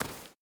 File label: stag footstep sand hard; Rust